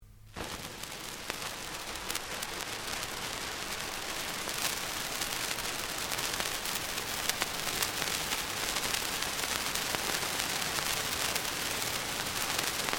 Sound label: Fireworks Spark Fountain, Domestic Fireworks